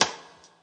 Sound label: Rim, Drums; One Shots; Slackjaw